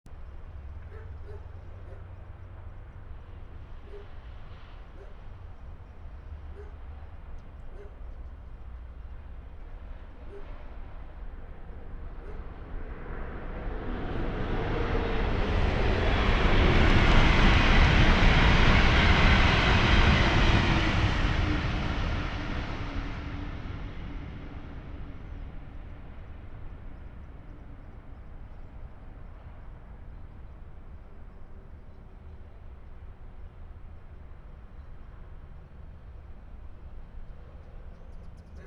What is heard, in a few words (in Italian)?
Treno; Eigenmike; ComunePR; NuovaStradaF